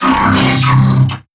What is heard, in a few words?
dry, strider, combat, movement grunt; Half Life Alyx